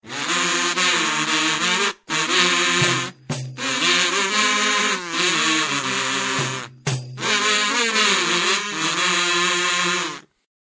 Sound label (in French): Sonnerie gurdil kazoo; donjon de naheulbeuk